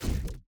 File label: block heal; Mindustry